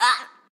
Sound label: Hurt; Jackie; Going Under